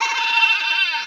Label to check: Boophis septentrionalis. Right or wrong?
Wrong